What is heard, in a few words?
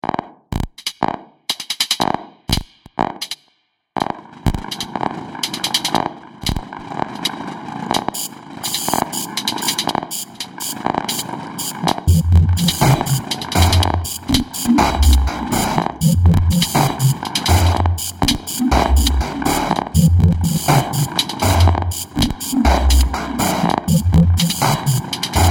noisetar dupstep